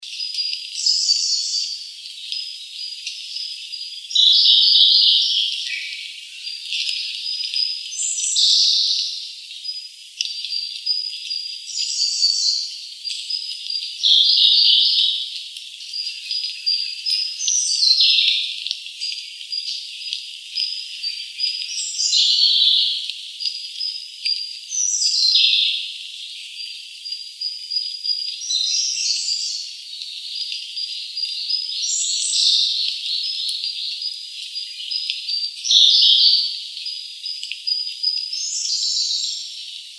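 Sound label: koruri S, Siberian blue robin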